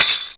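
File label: DRUMTRAKS tambourine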